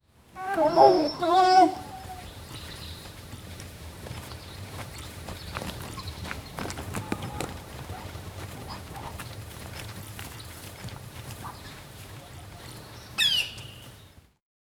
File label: elephant walking through; wildlife